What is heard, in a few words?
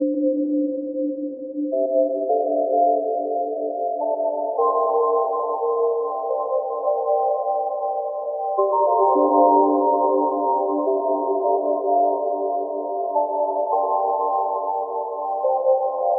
rain beat breakbounce